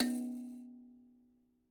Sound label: Mbira B2, Kalimba, Kenya; Plucked Idiophones